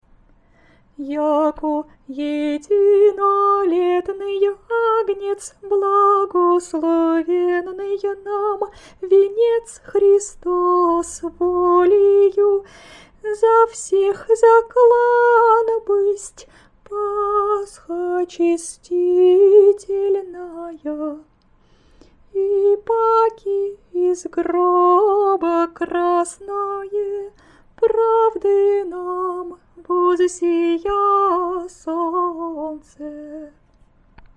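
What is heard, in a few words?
easter canon byzantine slower, singing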